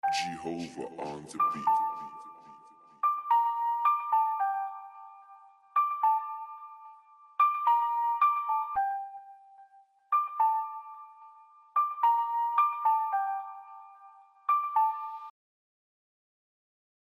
Lead Vox

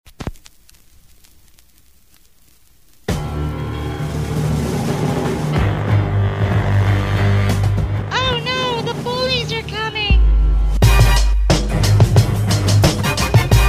Intro (Instrumental)

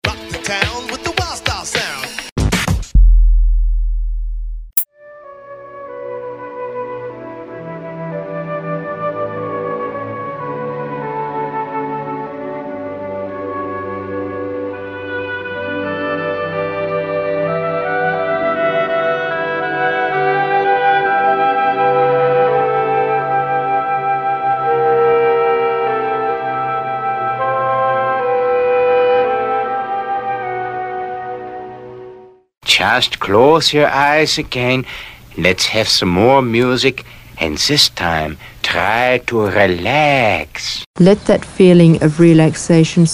Beats Samples; Burntablism Volume 1

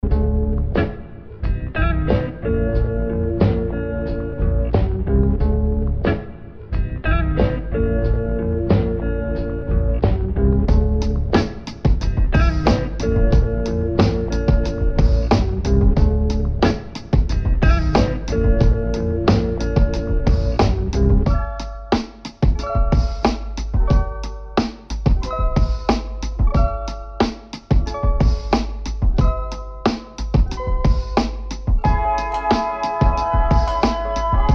Loops; Burntablism Volume 1

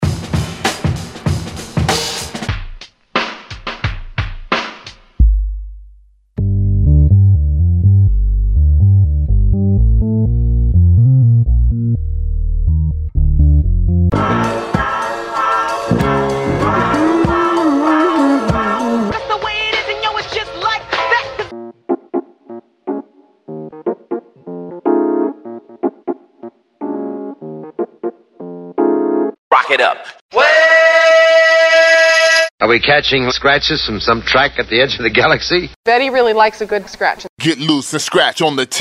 Samples; Burntablism Volume 1

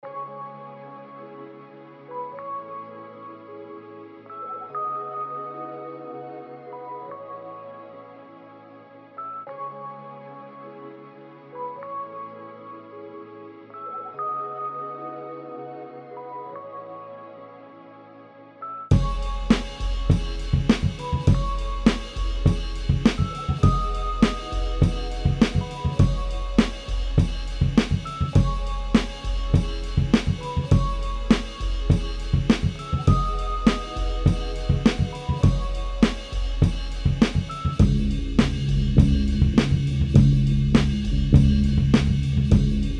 Scratch, Burntablism; Burntablism Volume 1

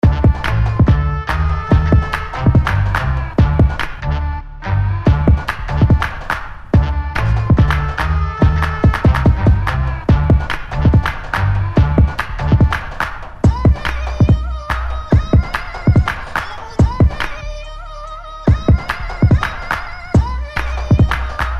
Beats; Burntablism Volume 1

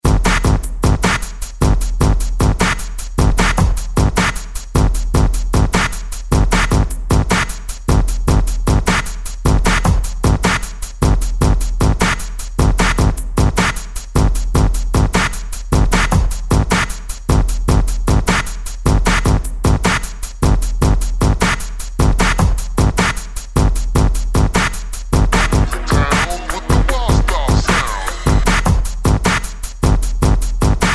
Beats; Burntablism Volume 1

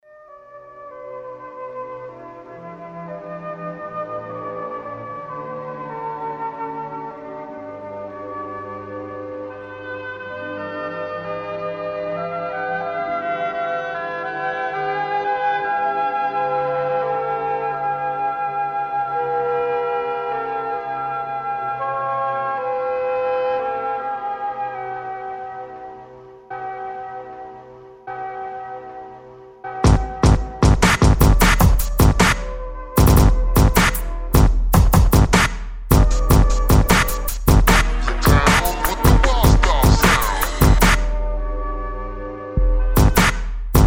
Beats of Burnen Break; Burntablism Volume 1; Breaks

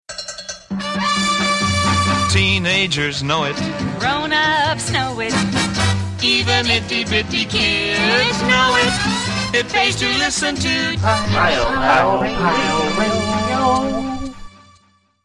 Bumper Music; File Pile Radio

